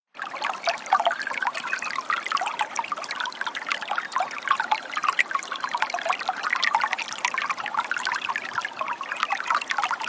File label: Brook CU Trickl Air BG, streams